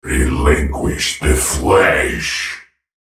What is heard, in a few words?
attack; Viktor; Character Voices - English; en US